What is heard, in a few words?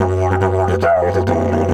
F 140, DIDGE RIFFS